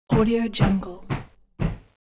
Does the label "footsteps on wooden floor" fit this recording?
Yes